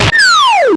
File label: bomb fire